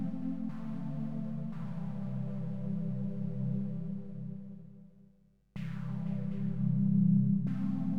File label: Trance